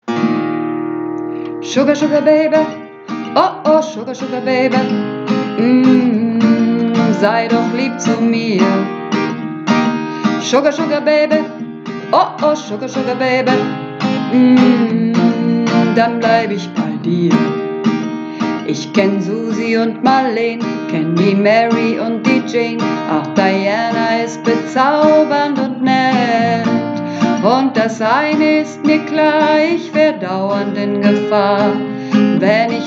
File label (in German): Hauptstimme